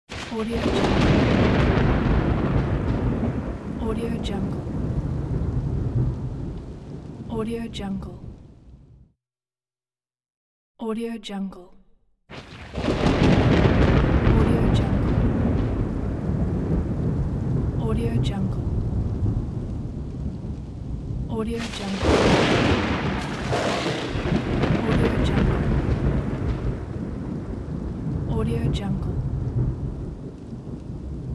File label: thunder roll and rumble